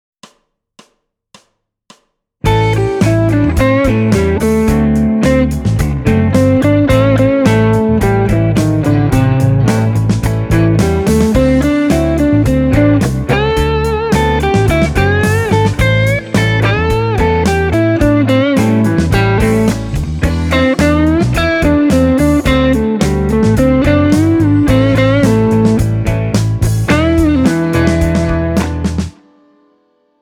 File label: blues